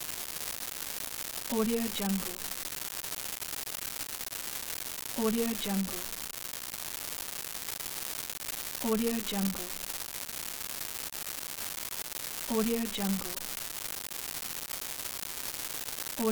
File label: digital error sizzle